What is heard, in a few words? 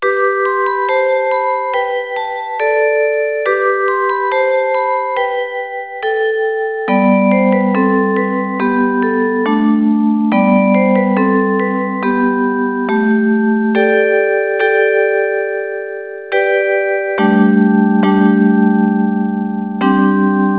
bell; concert